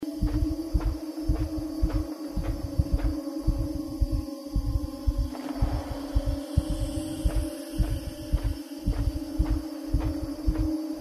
herzklopfen; inside

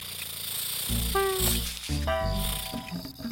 fliege; botanicula; pc

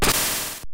bumerang; kirby s dream land

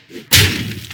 schlag; hearthstone

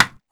block waffe; mount blade

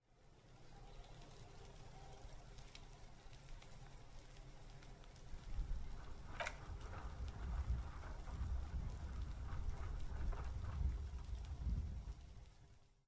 leichter regen und knistern ambientsoundsample

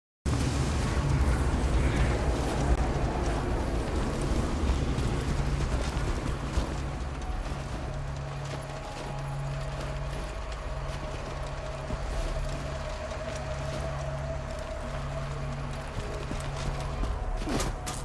musik ausserhalb; borderlands 2

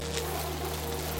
biene gross; botanicula

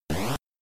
feind tot durch stein; Dig Dug

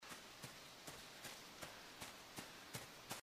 laufen im grass; inside

